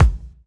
mid range bass drum single hit a sharp key, kickdrum tuned to the A# note